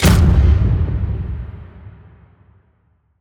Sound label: Coilgun, Weapons